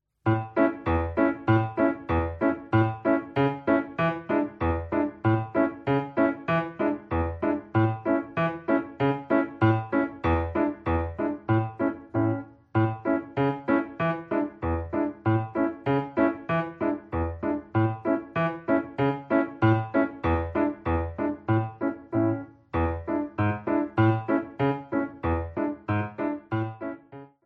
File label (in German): scale reel mitspielversion